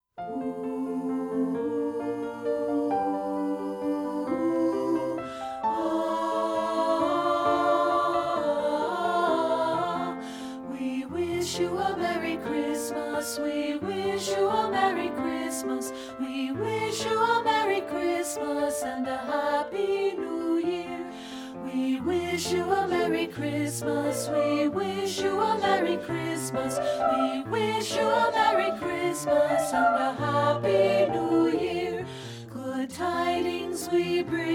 WE WISH YOU A MERRY CHRISTMAS; partbypart

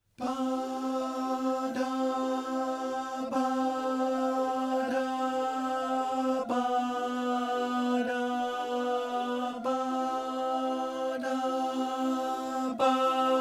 VOCAL PRELUDE BASS; satb; partbypart

